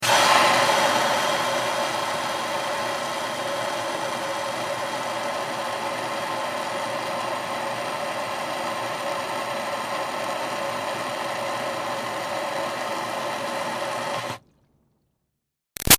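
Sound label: Water Spray Interior Car Steady Hard; Car Wash; Spraying; Big Room Sound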